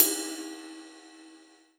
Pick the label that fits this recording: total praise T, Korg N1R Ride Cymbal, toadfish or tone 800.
Korg N1R Ride Cymbal